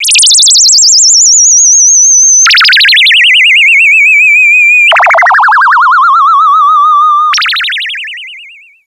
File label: INSECT, Special FX Twisted; USB Soundscan; AKAI